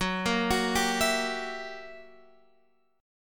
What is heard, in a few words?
F sharp 7th Flat 9th Guitar Open F, chord; F#7b9 arpeggiated